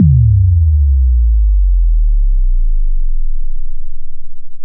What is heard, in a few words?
Fill, Drums, Eclectic Electronica